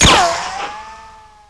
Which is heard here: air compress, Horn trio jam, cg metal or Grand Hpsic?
cg metal